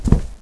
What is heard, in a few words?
carpet; valve